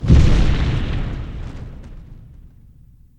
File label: trex footstep